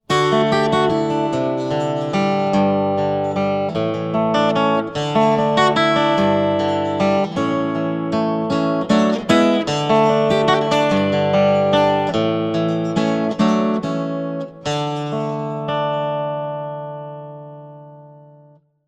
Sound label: acoustic